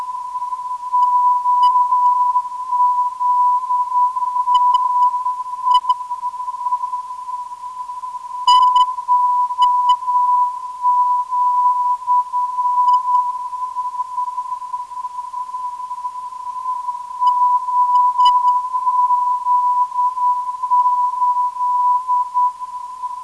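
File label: 372kHz, non-directional beacon; OZN-2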